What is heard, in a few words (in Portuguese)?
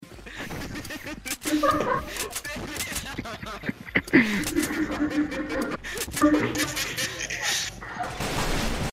risadin